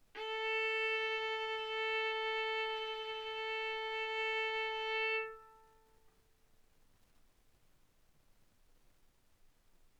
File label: Violin arco mf sul A A4 B4 stereo